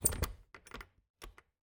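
Refrigerator, old fashioned, wooden, steel, brass clasps, hinges, open, jostle; Eiravaein Works; Vaeyan IV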